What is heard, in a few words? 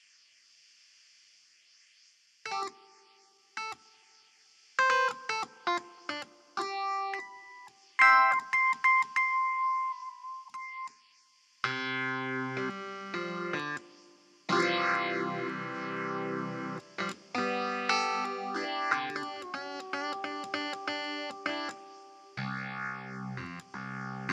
Clavinet